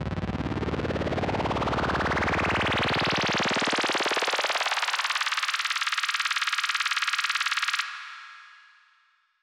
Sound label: Deep Space Textures fx oneshot siren sweeps complex osc long verb